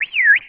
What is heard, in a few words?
Instant Message; FireTalk